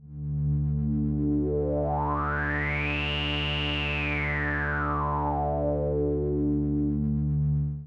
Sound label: moog filter; moogov filter